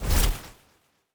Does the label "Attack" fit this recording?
Yes